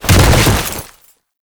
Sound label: Body fall Default, Foley; Warframe; Lasrian Soldiers